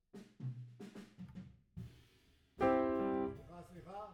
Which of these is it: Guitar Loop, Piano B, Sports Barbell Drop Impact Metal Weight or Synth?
Piano B